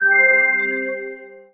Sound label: object found; Nickelodeon - Back to School Search